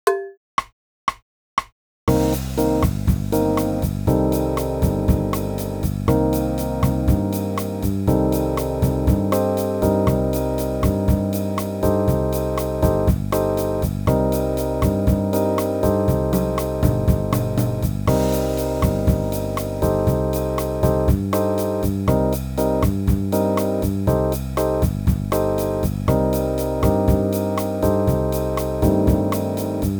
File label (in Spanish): C Dórico Concert; clases de jazz